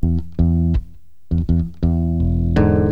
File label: bass